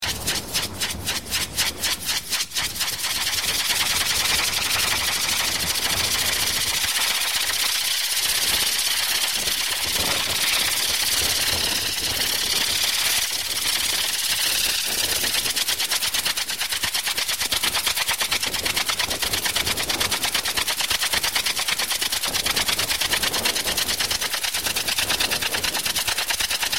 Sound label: wobbledelic crunktronica